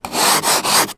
transportation ice scrape from car window external